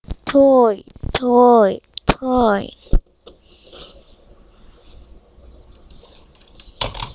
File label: toy